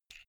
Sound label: buckle plastic open soft